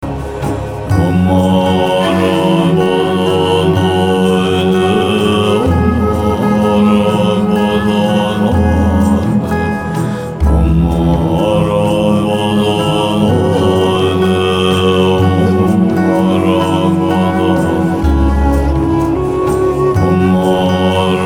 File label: Mantra of Manjushri; buddha within yourself